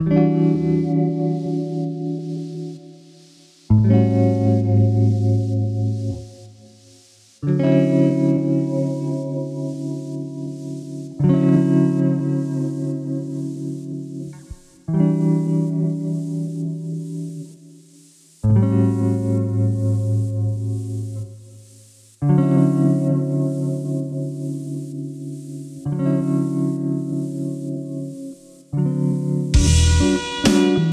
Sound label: chilling funk, 432 Hz